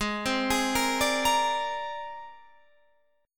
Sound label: C 7th Suspended 2nd Sharp 5th G sharp